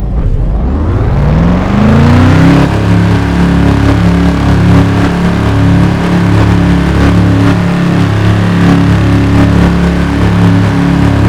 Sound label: rev, vehicles cars; lwcars